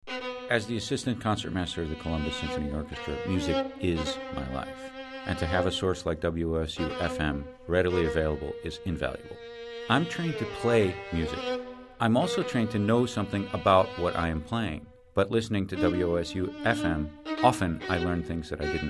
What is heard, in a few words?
Classical